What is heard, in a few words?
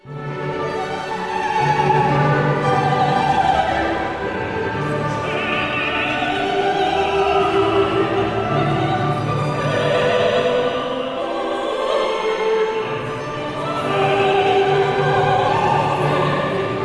Battle Chant; ragnarok